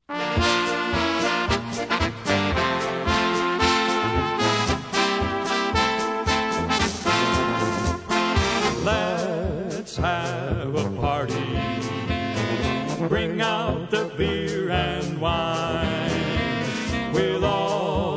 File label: LET'S HAVE A PARTY POLKA